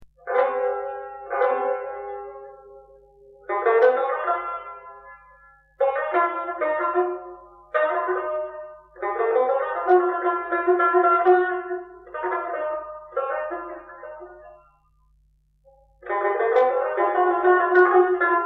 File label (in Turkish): tar